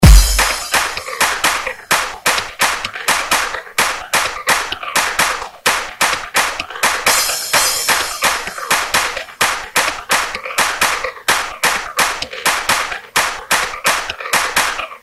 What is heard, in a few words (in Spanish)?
Sonnerie